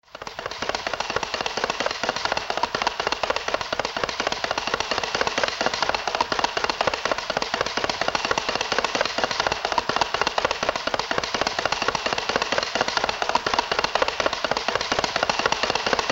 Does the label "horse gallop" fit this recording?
Yes